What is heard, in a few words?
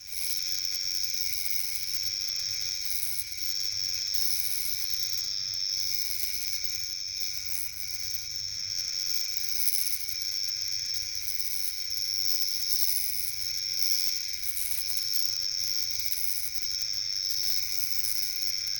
eerie amplified insectoid, insect